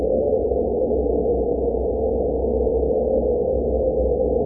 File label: tunnel wind; svencoop; st mission